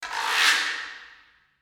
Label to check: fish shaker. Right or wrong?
Right